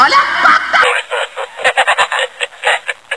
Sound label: knife